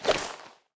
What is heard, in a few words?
punch miss